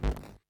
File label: Purple Teleport Power Off